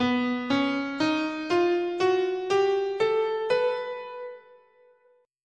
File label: B Lydian Minor